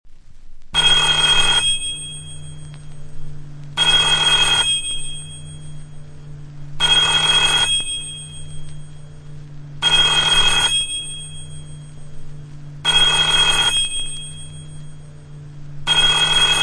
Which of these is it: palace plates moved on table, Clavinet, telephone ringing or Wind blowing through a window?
telephone ringing